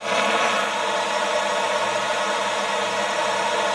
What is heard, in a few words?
alto sax G4; Xsample VOL-2